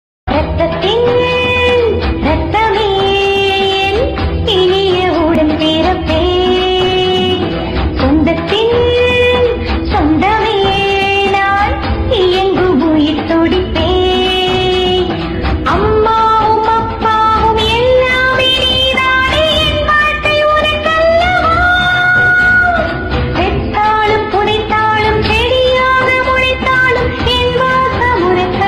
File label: female version